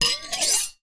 knife large blade scrape hard surface or other blade